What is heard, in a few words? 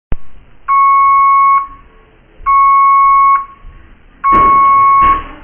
oven timer